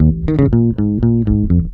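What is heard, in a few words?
bell, Roots Disco; USB Soundscan vol.32; AKAI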